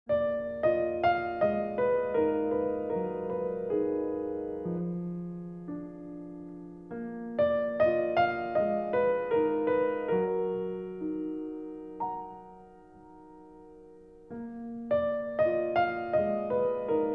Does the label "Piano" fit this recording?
Yes